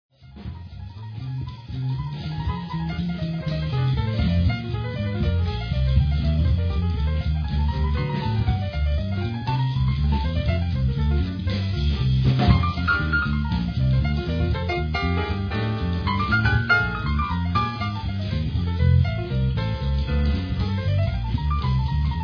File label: piano